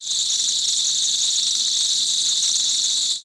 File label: Cicadas Continuous